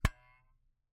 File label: Camera, Aimex SP500, point n shoot, plastic, knock; Eiravaein Works; Vaeyan IV